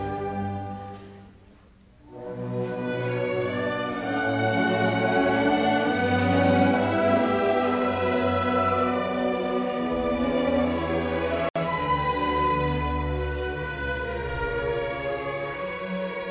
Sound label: school massed orchestra; rickmansworth